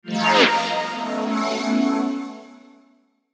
SWEEPER WHOOSH, Sweeper Whoosh Shimmer Fly By Warble; Production Element Imaging Element Accent Transition; Sweeper Sound Effects